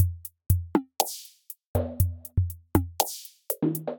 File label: Yellow Kit, Synthesized; Drums; Ableton; Factory Packs; Drum Essentials